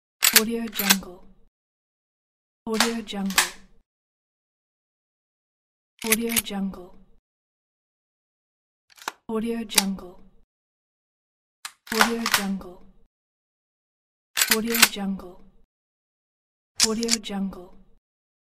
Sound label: gun reload